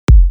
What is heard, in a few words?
Kick 55Hz A; Angel